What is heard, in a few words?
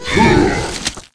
DMG